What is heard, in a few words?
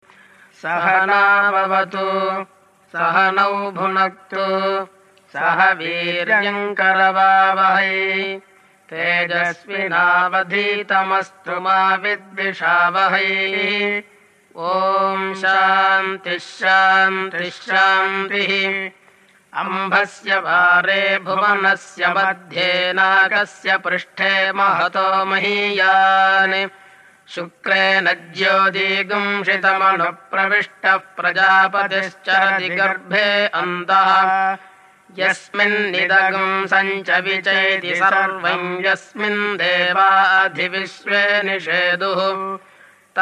Vedic chants